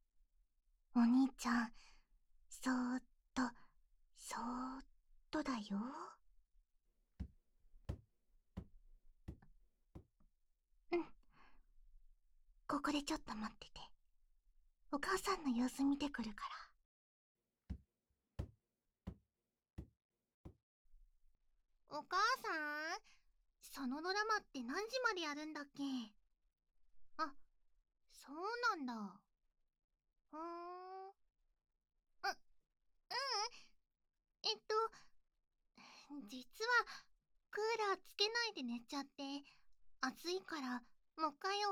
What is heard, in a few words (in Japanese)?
お風呂で フェラ; もう一度お風呂でお兄ちゃんのを……